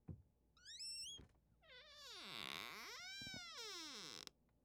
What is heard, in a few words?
Squeaking door